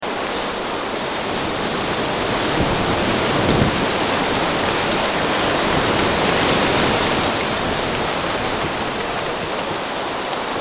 tree loop, weather, Sound Effects; Sound Effects Ringtones (Water Weather)

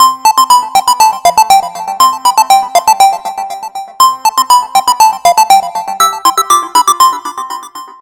Game, ringtones; Mi Mix 2S Stock Ringtones

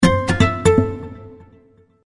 Weight; HTC Mobile Ringtone

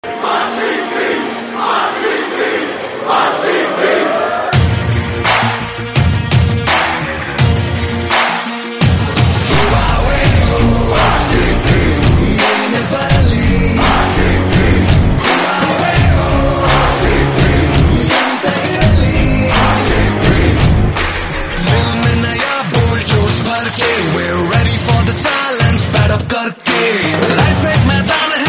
Ringtone; IPL 2021; Ipl Ringtones

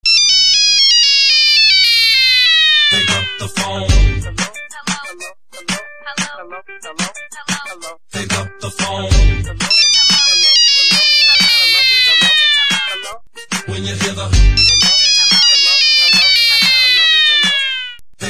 nokia dying ringtone pick up the phone remix

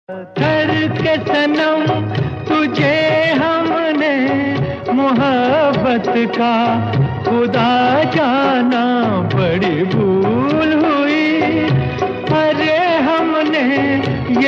TITLE SONG, Hindi old Sad Ringtones; Hindi Movie Ringtones

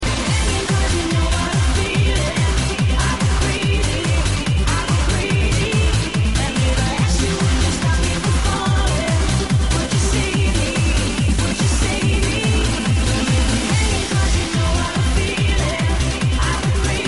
club ringtones; Funny RingTones

